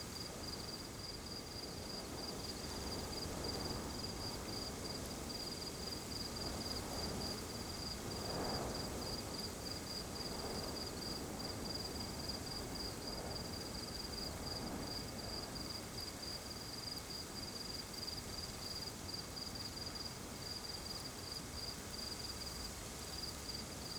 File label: ambience forest night breeze dry; Rust